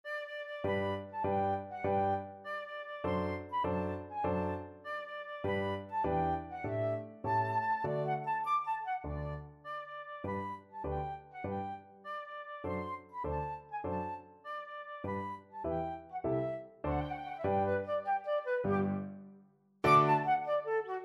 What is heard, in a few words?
paganini witches dance, flute